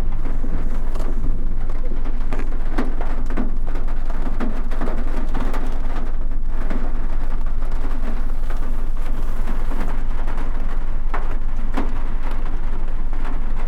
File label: raining on the car hood, city